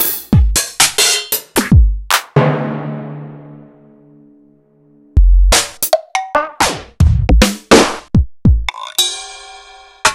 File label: EMU Proteus-2000; Sliced; m8